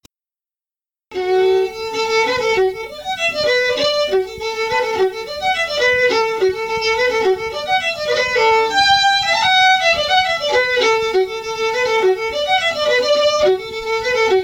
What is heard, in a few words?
fiddle; silver spear